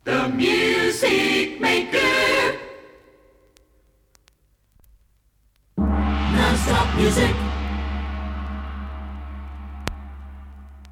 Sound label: jinglepackage; topformat LP jinglepackage 2